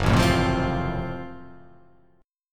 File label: F sharp Minor Major 7th Flat 5th F sharp down